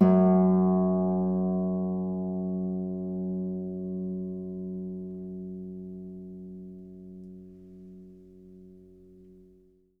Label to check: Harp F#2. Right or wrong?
Right